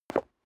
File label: step leather shoes concrete single stair up; schritte